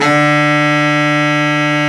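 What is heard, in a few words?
baritone sax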